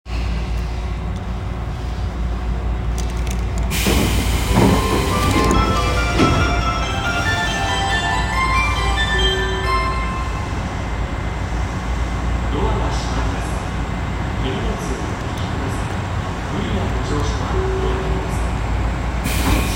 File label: train sounds; 有楽町線要町西